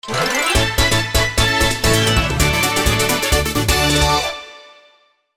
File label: Battle Victory; Chiptune; Gamecube; Paper Mario The Thousand Year Door